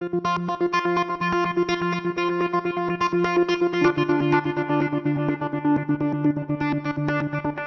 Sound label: Arp Dark, 125bpm